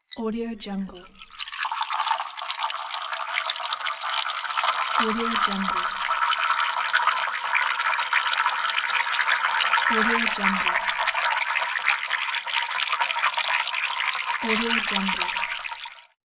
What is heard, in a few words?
pouring liquid into glass